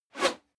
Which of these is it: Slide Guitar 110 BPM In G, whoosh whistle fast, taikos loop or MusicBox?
whoosh whistle fast